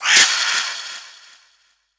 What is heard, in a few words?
Draconic Viper Death; Kilta